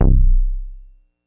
Memorymoog BASS Thump Low - C; Synth; One Shots; Cymatics; Fantasy Synth Sample Pack

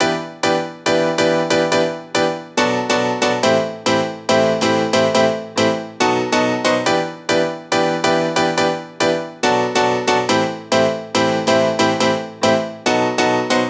Future Bass Piano Loop 140 BPM E Min; Instruments; Piano Loops

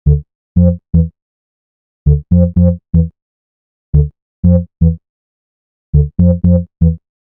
Loop Melodics 120 D; Deep House; Ledder; Ghosthack; Advent Calendar 2019

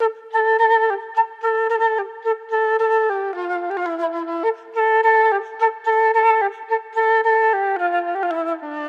Flute Loop Wet - 108 BPM D Min; Melodics; Instrument Loops; Flutes; Processed; Cymatics; Cobra Hip Hop Sample Pack

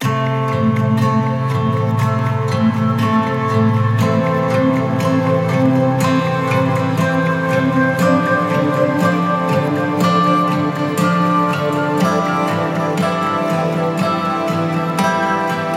Guitar - Am 60BPM; STEMS; Ghosthack; SkyRealm; Mood Kits; Mood Kit 08 - Shining Light

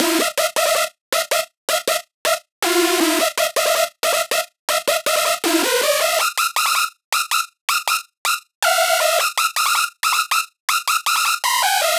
Synth Loops E 160 Scraping; Trap and Dubstep

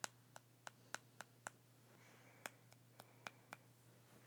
Tooth Taps Misc; Fairlight Sound Lib

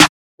Snare - Roller (D#); Drum One Shots

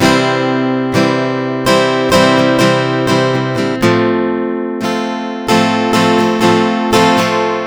Guitar - 125 BPM (C Major), Acoustic Guitar; Happy Feelings; Ghosthack - Free Guitar And Piano Samples

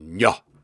Grunts and Chants, Viking Vocal

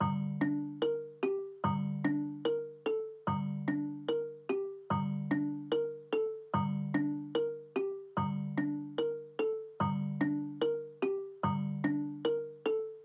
147 BPM G Min Bells; Cymatics; Angels

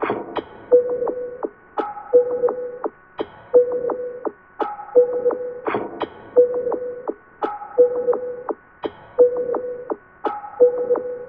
Submarine Perc Loop - 170 BPM; Cymatics; Fiji Hihat Loops & Percussion

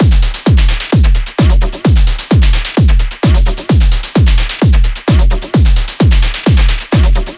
Drum Loop 130, Techno; Ghosthack; Free Techno Sounds 2024

